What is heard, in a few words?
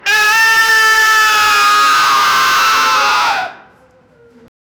an indian man gets a, pain